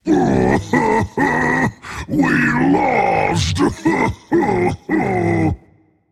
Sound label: boohoo rage; chaac